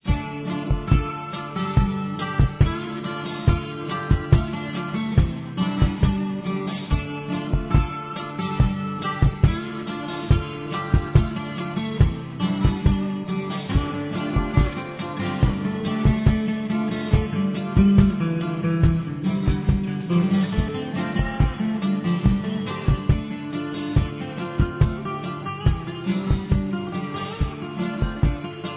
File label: music home